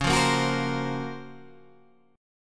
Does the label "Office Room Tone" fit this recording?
No